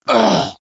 death; cj

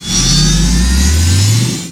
power up, shield

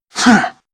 light pain; zero

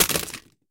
mag drop pistol; universal

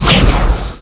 hit, weapons

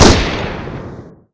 M16 Fire

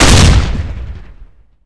heavy rifle

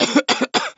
cough

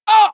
hurt med; cj

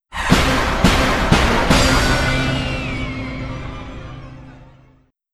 level up

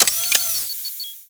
overheat

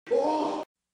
hurt med; billy

